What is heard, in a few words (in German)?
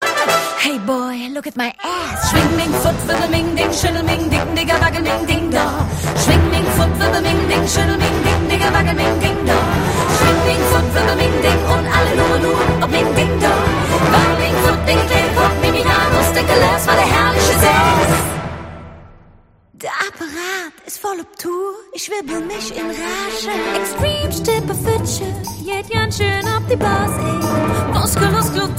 funkhausorchester